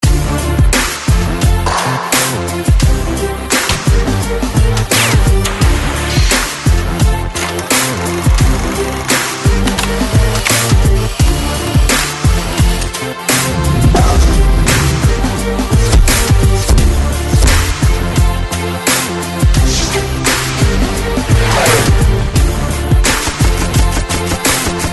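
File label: Samsung Galaxy Note 20 Ultra Ringtone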